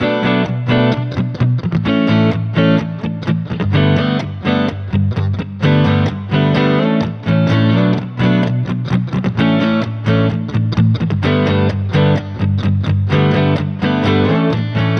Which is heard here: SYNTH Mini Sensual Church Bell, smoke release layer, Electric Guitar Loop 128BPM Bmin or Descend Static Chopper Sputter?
Electric Guitar Loop 128BPM Bmin